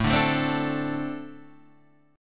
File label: A-Minor Double Flat 5th A Guitar DADGAD